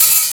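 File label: open hat; Funky Ass Loops; East West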